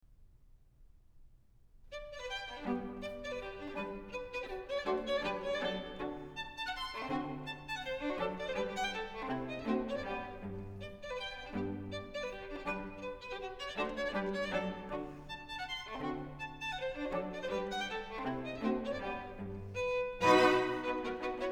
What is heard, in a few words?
String Quartet No. 2 in G Major, Scherzo (Allegro) - Trio; Ludwig van Beethoven; Beethoven - String Quartets No. 1-6, Op. 18